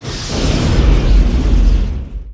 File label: shield hit; cardassian